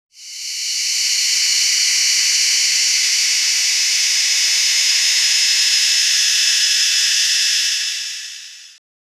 chants intensify

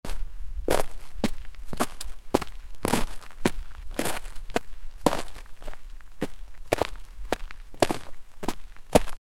heavy boots walking on

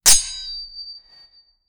make a sword hitting an, armor impact

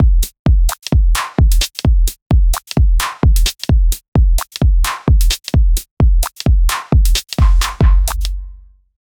groovy elektro beat 130bpm

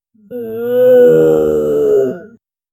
occasional anguished groa

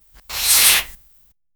female hair dragging

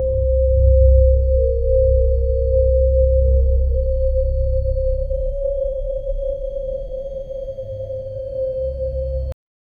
a creeping dark ambient

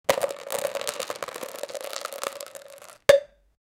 take a plastic cup from, crinkle

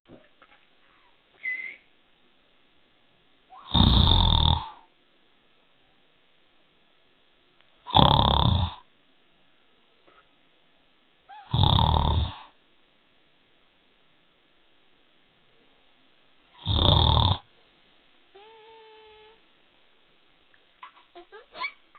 5 years old boy, sleep

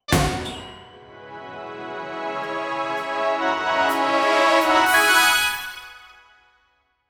big win 7s circus swing, carnival

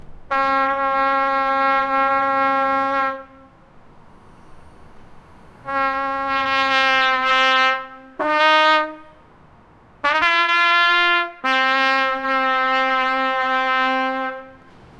sounds like trumpets but; instruments

